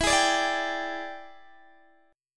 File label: E 7th Sharp 9th Guitar Open D, chord